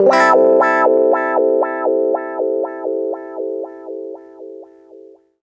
120 WAH PAD, Electric & Acoustic Guitar Loops

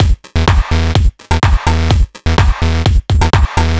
Full, Drum Loops 126bpm, Nu Dance Electro; Zero-G; Soundclash Nu Dance Electro; Acidized Wavs; Dubnobass

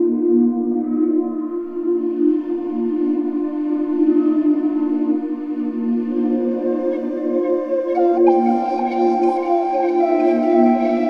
Spirit Flute; Sonic Foundry (Sony Creative Software); Ma Ja Le Ethereal Textures; Flutes

